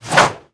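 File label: Attack Two Staff; Seer; GamigoNA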